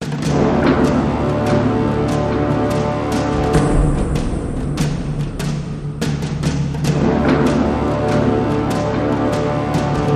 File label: No Choir